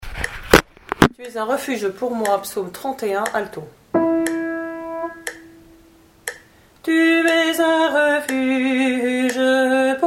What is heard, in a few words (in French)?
Alto; Ps-031 Tu es un refuge pour moi; Gouzes